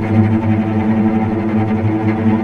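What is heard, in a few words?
cellos tremolo A2 piano left, string; East West - The Ultimate String Collection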